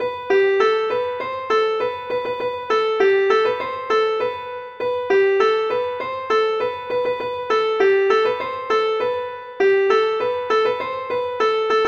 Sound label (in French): suite passes pieds